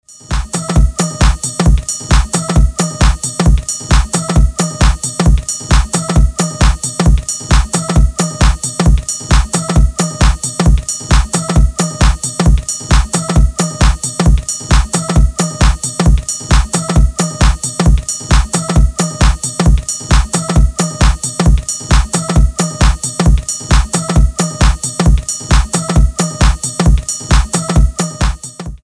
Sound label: Loop